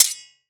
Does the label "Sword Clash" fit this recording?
Yes